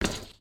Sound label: tin, step